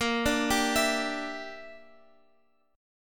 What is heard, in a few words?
Bb 6th Flat 5th Bb, Guitar Open F; Bb6b5 arpeggiated